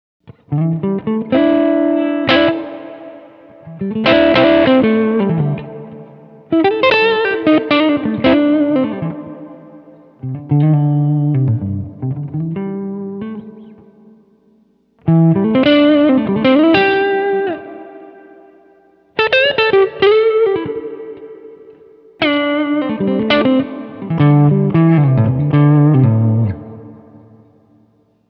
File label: spring; BigSky; reverb; Blues Hall